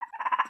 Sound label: Pets Skunk Idle Short; creatures; Lego